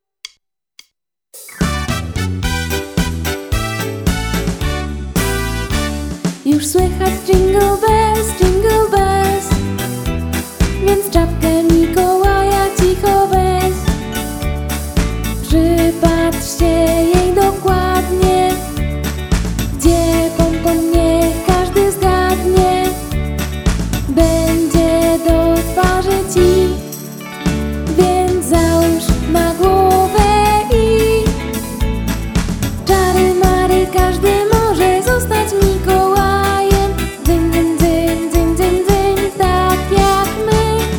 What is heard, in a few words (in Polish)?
wersja wokalna